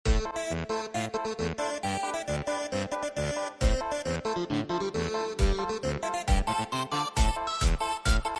Tribal goofy

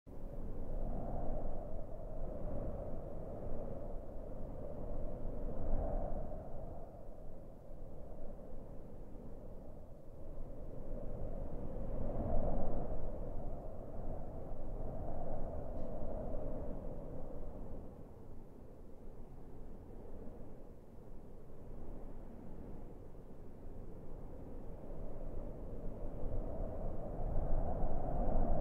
Wind Howling

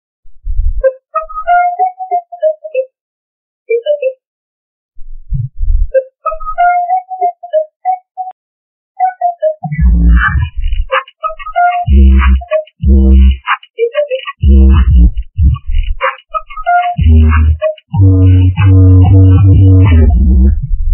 among drip lobby music low quality

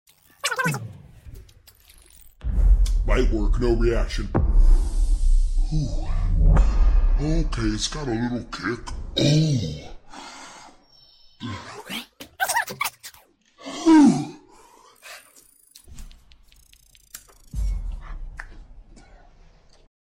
eats wings slowed; Jynxzi; memes

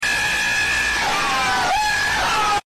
Screaming cat meme

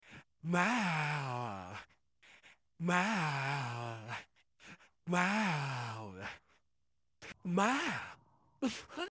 MAAOOWW; animals

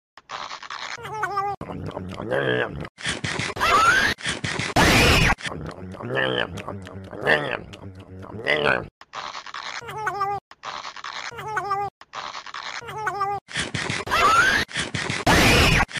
eating chicken; Shedletsky

